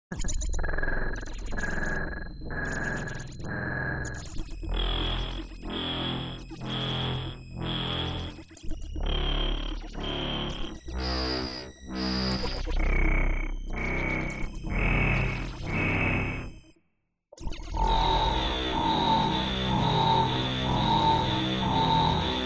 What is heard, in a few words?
FX Alien Chat